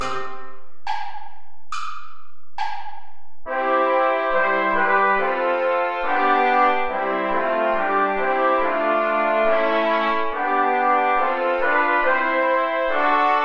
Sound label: play along without trombone; lobet den herren alle die ihn ehren